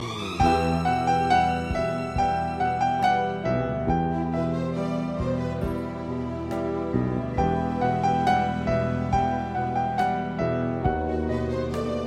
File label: Piano Sonata